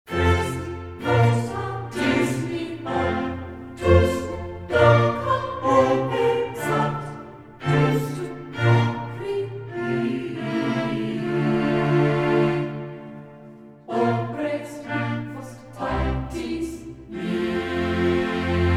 Baroque Choir

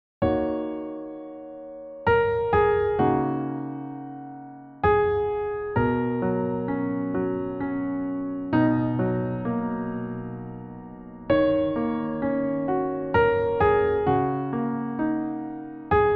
Newage, 감성

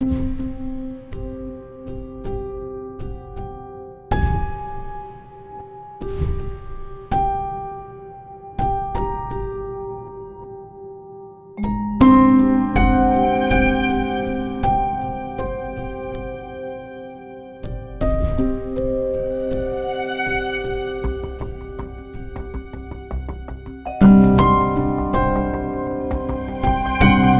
Tension Mystery

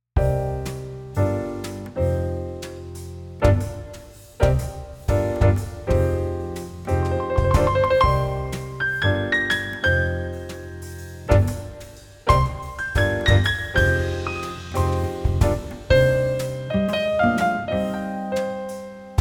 Chemical Blues, Jazzy

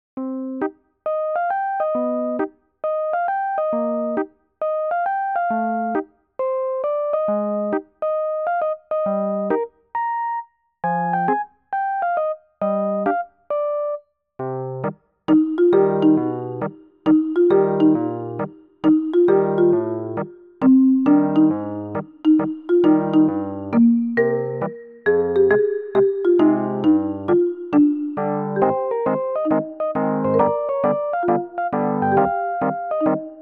Newage

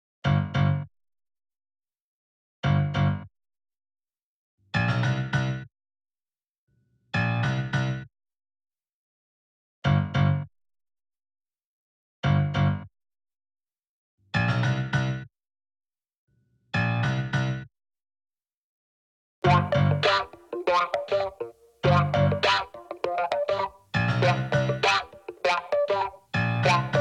Brass Show, Funky

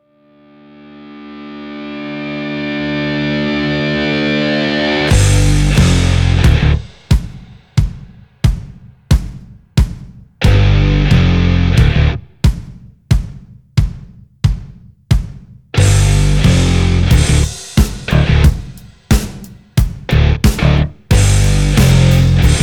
Rock Guit

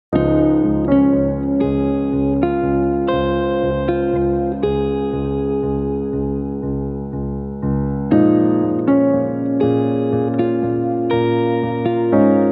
Grace Rain, 감성

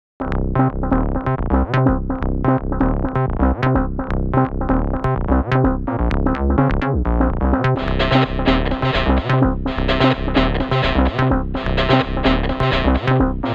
Pitchy Lava, EDM Pop

